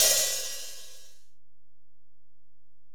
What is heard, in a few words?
HiHat, Alesis DM-PRO